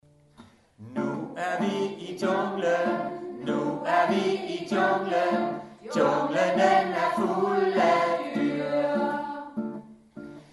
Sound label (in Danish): Sang